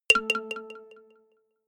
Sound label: notification kalimba delay